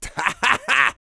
A YELL, MALE HUMAN; ILIO; Vocal Planet

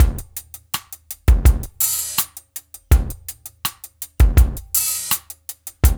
DRUMS; Funky Ass Loops; East West

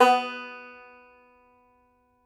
TENR BANJ, Tenor Banjo; Plucked Strings; E-MU Producer Series Vol. 7 – Old World Instruments

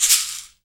CAXIXI DOWN, Latin Hand Perc; E-MU Producer Series Vol. 2; More Studio Essentials; Composer

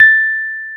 Electric Pianos; Wizoo Electric Pianos

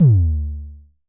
Mild Thump Kicks D2, Drums, Vermona Kick; LookIMadeAThing Kicks; Mild Thump Kick - Precise

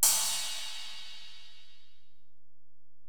Ride, Alesis DM-PRO, Drum Machines

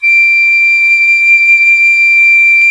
FLT PICOL, Flutes, Picolo& Flt

